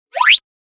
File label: pyoko